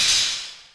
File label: mesh; ambient